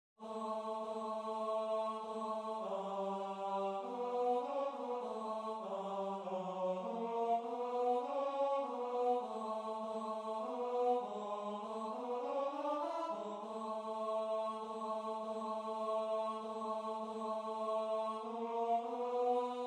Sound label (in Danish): Tenor; Det kimer nu